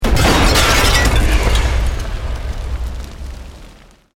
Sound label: Explosion Medium Brick Wood Glass